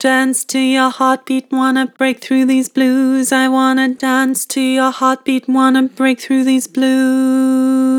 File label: Vibrato, Vocals; Dance to your heartbeat; Harmor Vocals